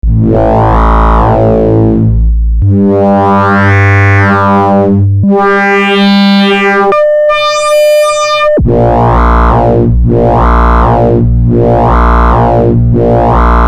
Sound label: 259 Timbre Sweep, Buchla; 259 vs 261e audio comparisons